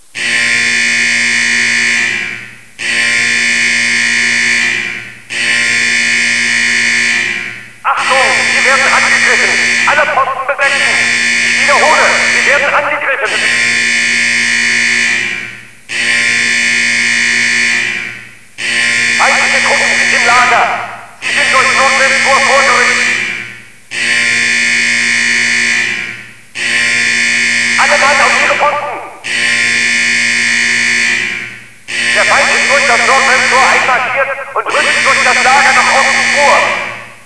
cold alarm

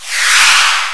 jet fly by

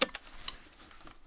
button

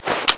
spas draw, weapons, SPAS12

